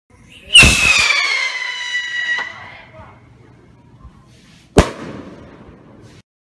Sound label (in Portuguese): foguete apito sound effect